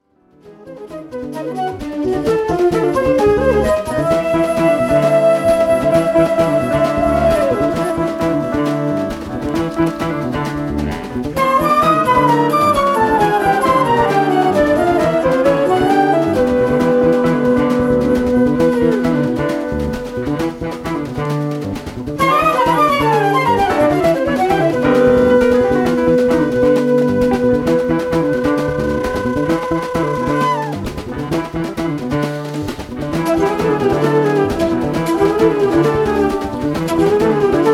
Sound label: batucada jazz